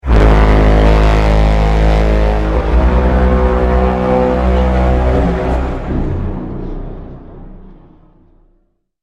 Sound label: reverb broken horn